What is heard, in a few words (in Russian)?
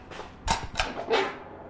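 степлер один раз скрепляет бумагу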